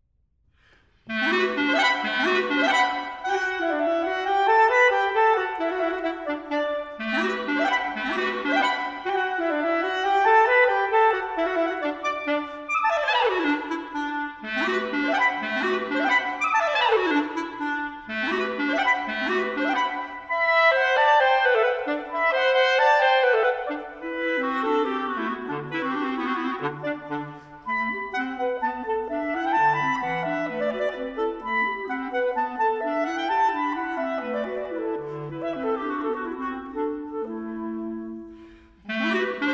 sonate pour deux clarinettes vif

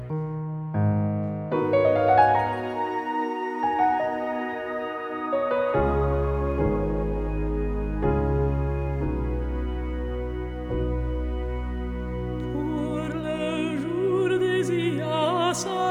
la flute de pan

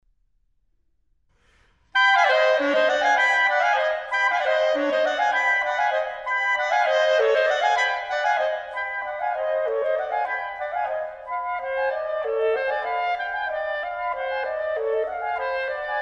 sonate pour deux clarinettes presto

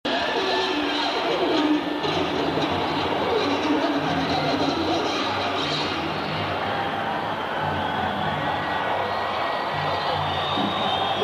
solo batterie; presentation groupe

